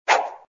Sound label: Jump; moonstone madness